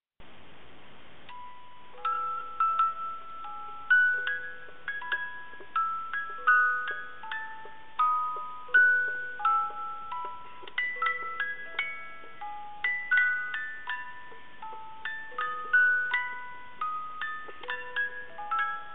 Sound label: Music Box O Tannenbaum